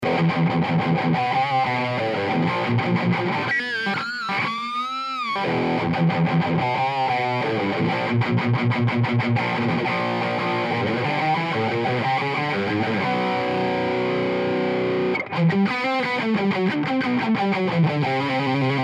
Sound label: Chisel Bridge Distortion